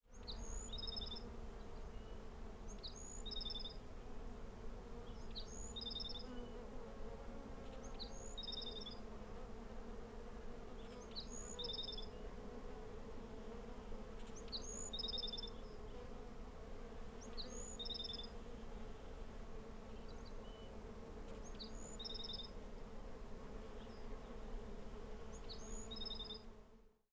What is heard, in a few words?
day birds insects; Salvador; quiet park